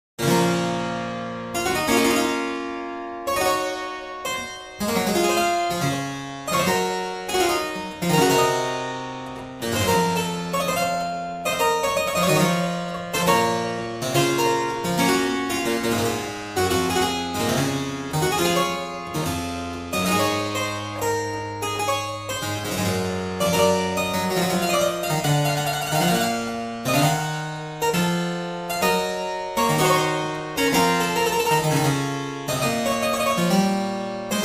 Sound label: partita dlja klavesina; BWV831